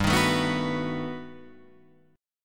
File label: G Augmented Major 9th, Guitar, Open D